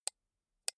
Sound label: computer mouse right click slow soft